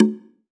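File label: BONGA LW, Drum Machines; EdgeSounds; Drum Mashines VOL-1; X-DRUMS KIT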